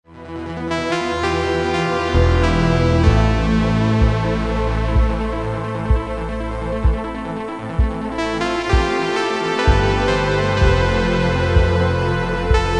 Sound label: Mobile ringtone